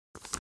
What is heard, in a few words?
Paper Pull